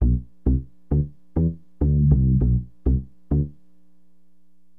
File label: SYN BAS, Acid Jazz Funk; Bass